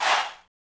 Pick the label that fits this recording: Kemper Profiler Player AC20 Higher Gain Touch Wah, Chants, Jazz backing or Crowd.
Chants